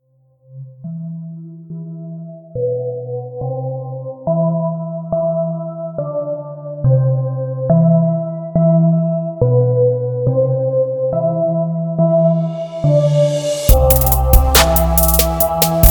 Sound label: Steel Drums